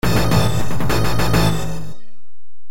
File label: jingle